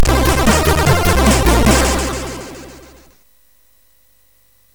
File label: Intro Jingle, MOS6581R3; sid